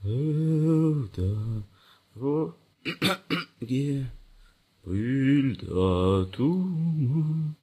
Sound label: humming, stalker; monsters